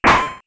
hammer; SNES; mario; SMRPG

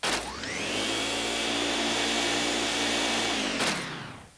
poltergust 3000 in action; GAMECUBE; LUIGISMANSION

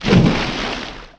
splash; N64; MARIOKART64

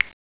Highlight; PC; MARIOSFUNDAMENTALS

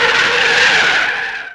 breaks; N64; MARIOKART64

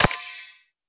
Press Key; PC; MARIOTEACHESTYPING2

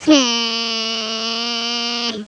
hurrrrrgh; DS; ML BOWSERSINSIDE; Yoshi (unused) (from Mario & Luigi Partners in Time)

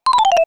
dry bones crumble; SNES; SMRPG

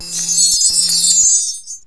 Sparkly Stars; PC; MARIOTEACHESTYPING2

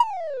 goal; N64; YOSHISISLAND

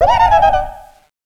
key chain; theater; Fawful; DS; ML BOWSERSINSIDE

